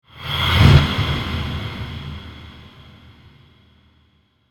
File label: dark swish